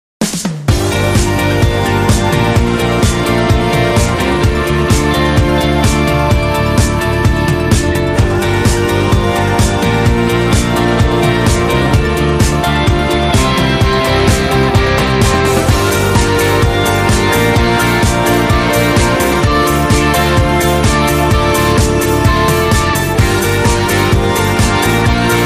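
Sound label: Atmospheric Keys Short Rhythm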